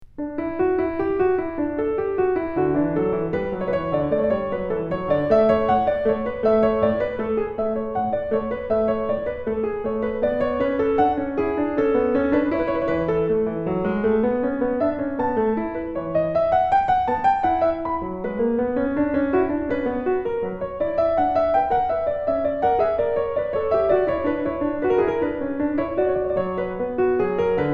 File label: Invention Piano; Bach